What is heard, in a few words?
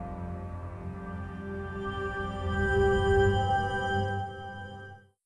Startup; Windows Blue Startup; Windows Never Released Soundpack 1